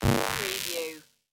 Retro Laser gun

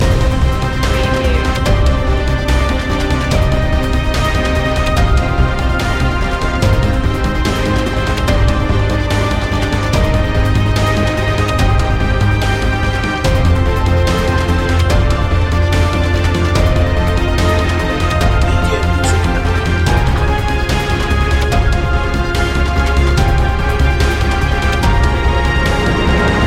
human machine loop

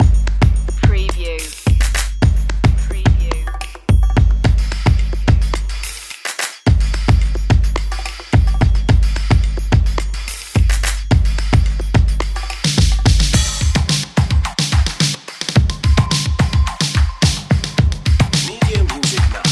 play that drums

